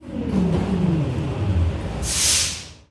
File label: train stopping